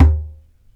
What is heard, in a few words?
DJEMBE, ETHNIC PERC; InVision Interactive; Lightware VOL-1